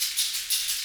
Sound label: metal jangle, perc fx; Roland LCDP14 Africa VOL-2